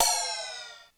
Ride, Korg DSS-1 Drums

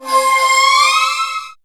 Ambient Atmos, Dance Synths

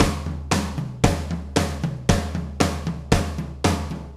Tom Tom Beat

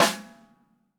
DW Green Maple Timbali Snare

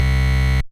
Buzz; Bitkits

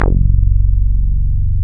Tekkno Bass; Best Service Gigapack 2

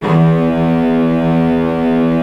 STRINGS

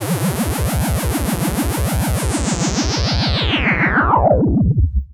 BassStation

